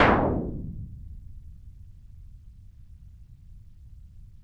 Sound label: NOISE, DRUMS; Music Store Sound Service; Einstein